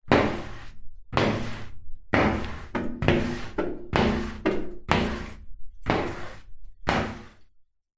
household bathroom shower squeegee wipe shower glass window